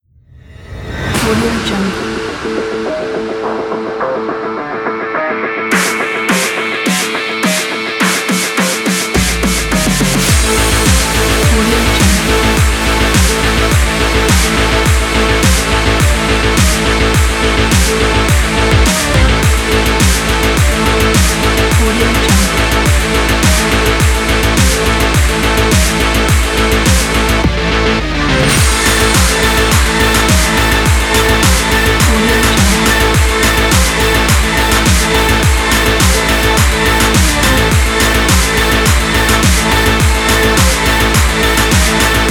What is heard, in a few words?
aggressive sport electro